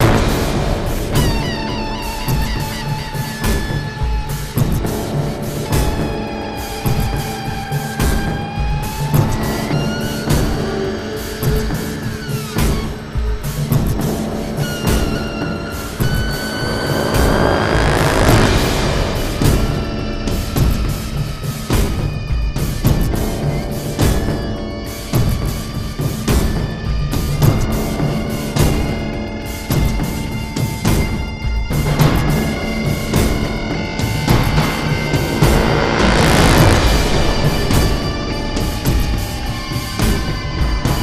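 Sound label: music, drums guitar loop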